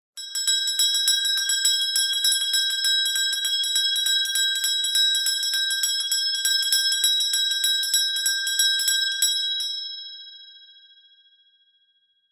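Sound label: zvuki kolokolchika, small bell sounds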